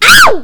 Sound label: hit, damage; LumiCu 2.0; minecraft